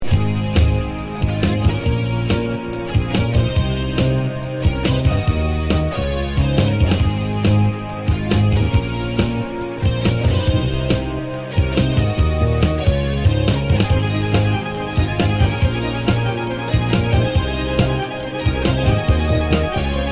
Instrumental

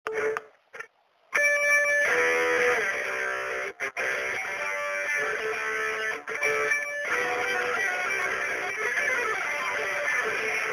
guitar solo

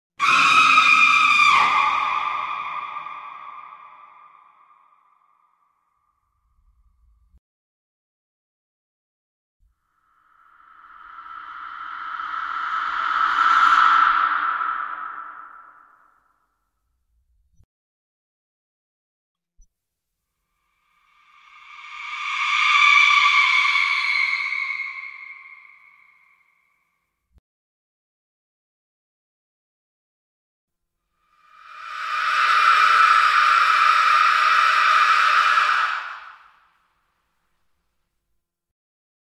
horror scary sounds creepy sound

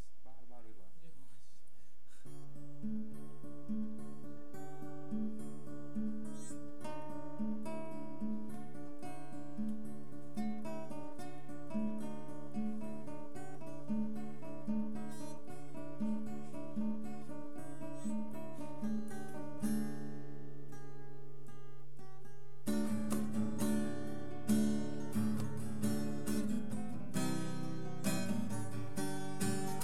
new riff acoustic fail

